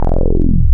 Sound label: VV3320 Sqr Res Sweep, wavetables; Animoog